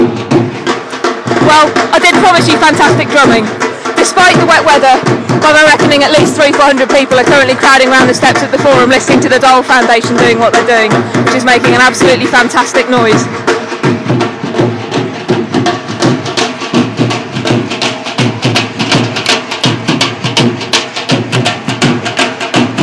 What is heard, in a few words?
dhol drumming; at the Forum for NNFest launch